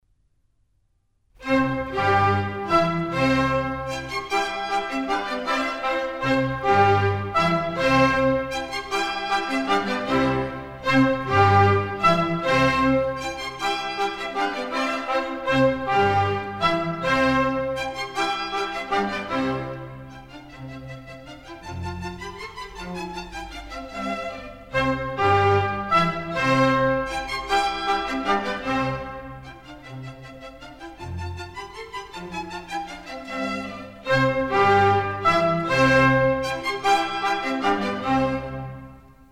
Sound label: Minuets, C major; Mozart; Dances and Marches